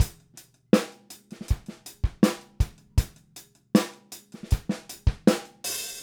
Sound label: 080 R&B-Pop Drums; Pop Rock; Moderate Tempo; Pro Tools Essential Loops; Digidesign